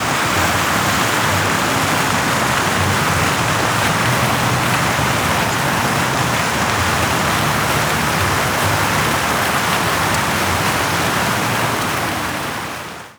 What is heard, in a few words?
Water Feature, water; McGovern Centennial Gardens; Houston